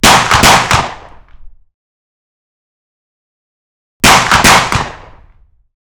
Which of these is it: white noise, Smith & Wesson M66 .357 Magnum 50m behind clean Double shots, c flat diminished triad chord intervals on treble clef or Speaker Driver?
Smith & Wesson M66 .357 Magnum 50m behind clean Double shots